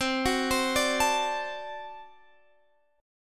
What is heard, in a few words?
D 7th, C